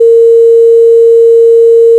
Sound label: fm voice single; FFTimbre